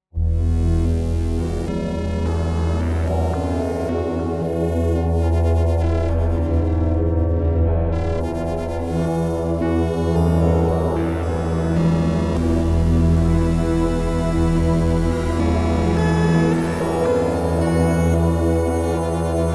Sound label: Montage